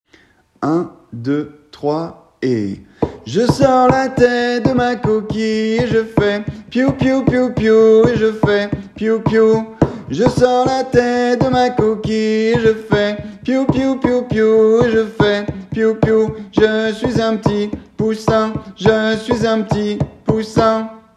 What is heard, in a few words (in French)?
theme chante